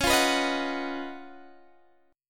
Db 11th Db, down, Guitar Standard; strummed; JGuitar

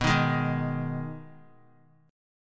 B 6th B down Guitar 4F 4A 5C 5G 5C 6E; strummed

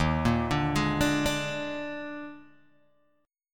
Eb Major 7th Suspended 4th Eb, Guitar, Open G

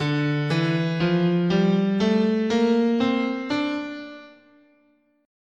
D Aeolian, guitar, scale

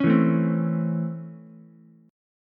C sharp Minor Major 7th Sharp 5th C sharp Ukulele; C#mM7#5 strummed; 6,4,5,3; JGuitar

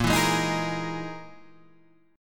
E Major 7th Flat 5th chord

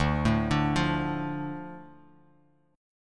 D Minor D, chord; Dm arpeggiated; 10,12,12,10; JGuitar

